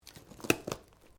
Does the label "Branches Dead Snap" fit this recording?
Yes